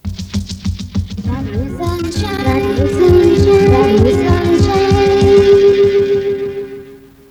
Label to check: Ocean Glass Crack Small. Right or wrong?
Wrong